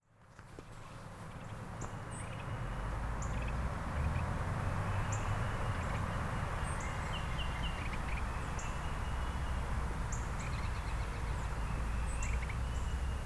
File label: Misty Soundscape